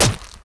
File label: meat Impact; impacts explos